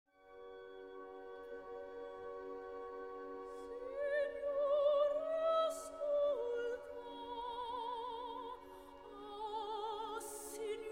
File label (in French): soprano; Puccini, Turandot